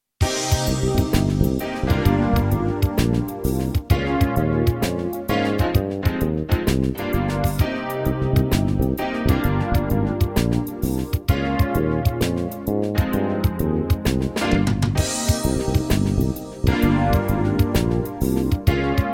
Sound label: Rock Shuffle